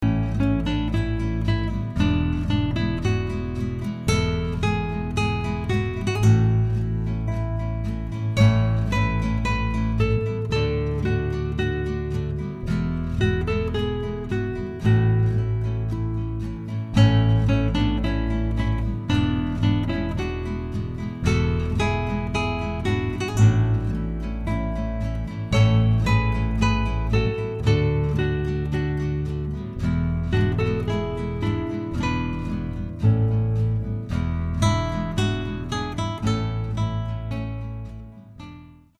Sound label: mexican guitars